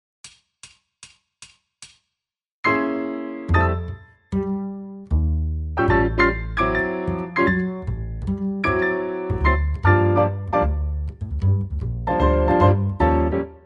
the days minus drums

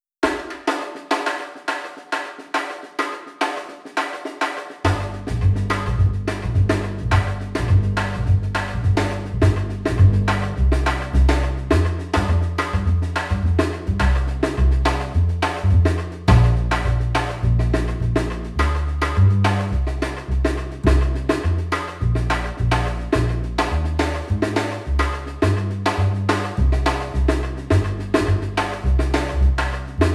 bossa nova minus piano

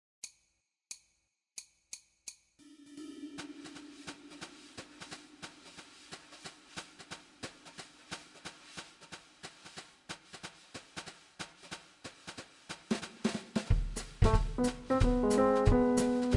valentine minus bass

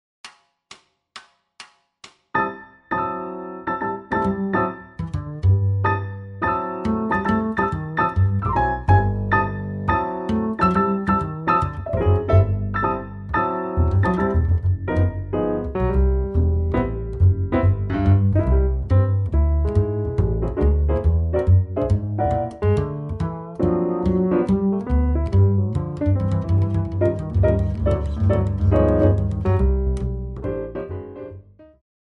minus drums

